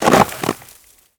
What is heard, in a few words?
foot snow deep, footsteps